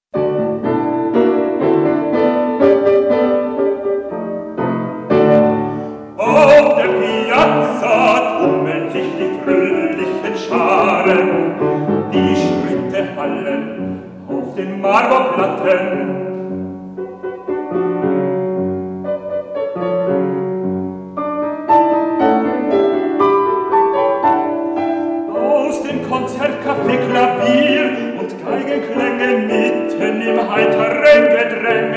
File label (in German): Venetianische Lieder für Bariton und Klavier; Venetianische Lieder (Auswahl)